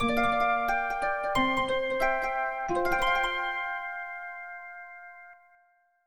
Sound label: Morning Jingle